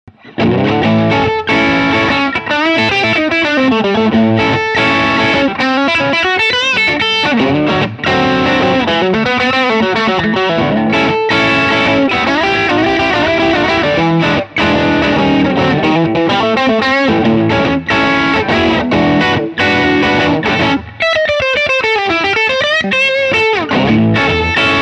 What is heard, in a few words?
electric blues shuffle